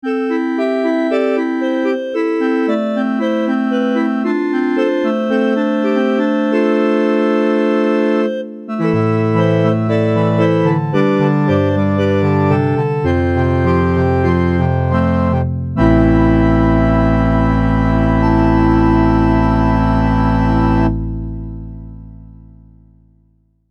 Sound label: Dark Cathedral, Prophet '08